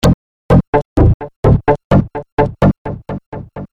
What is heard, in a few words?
Loop